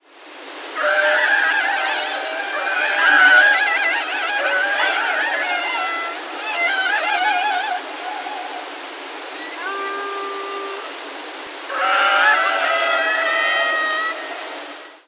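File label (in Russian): шум моря и крики касаток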